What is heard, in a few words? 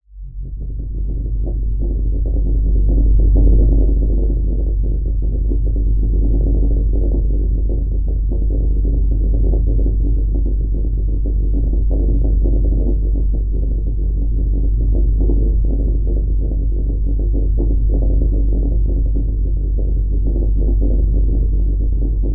Digital Crusher; martyn stonehouse